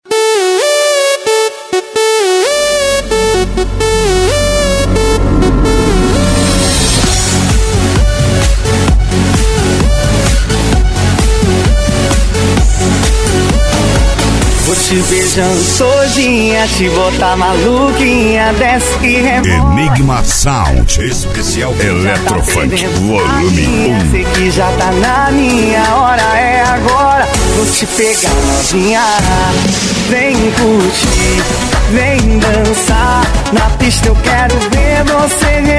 especial eletro funk

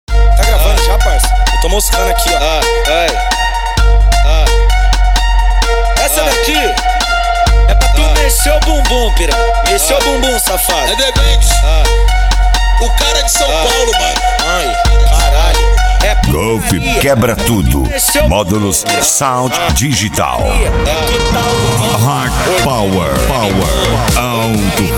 eletrofunk

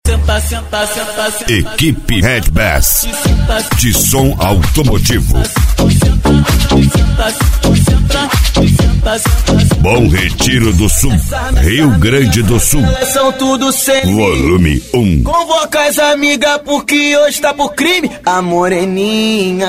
som automotivo; equipe redbass

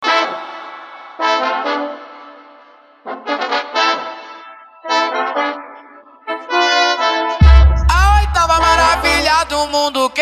mega funk e funk mega bass

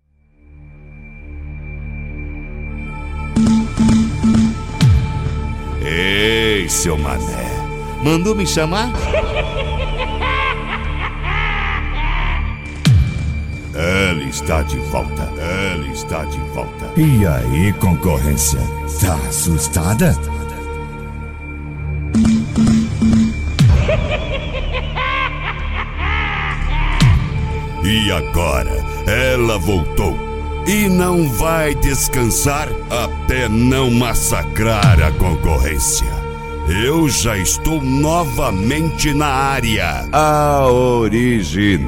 esp pancada

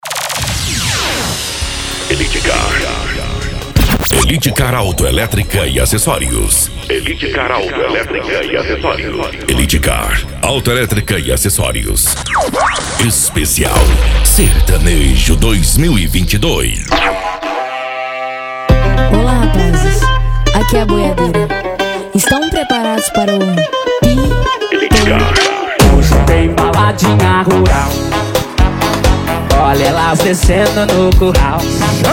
sertanejo

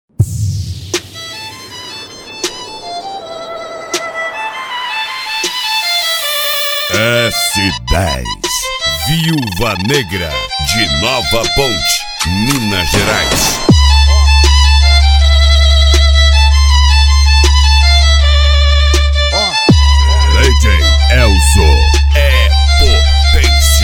violino; s10 viuva negra

